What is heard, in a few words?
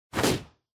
Motion Grab Gentle